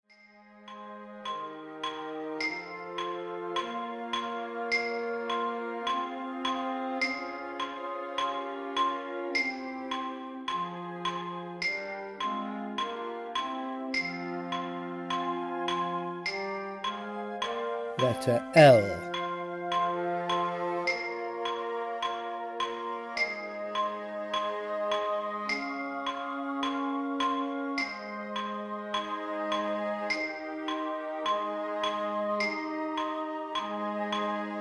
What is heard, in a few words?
exc with clicktrack; Gabrieli